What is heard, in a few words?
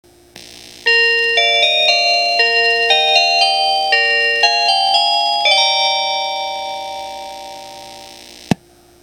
kiha40 chime; higashinihon